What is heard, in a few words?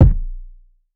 Kick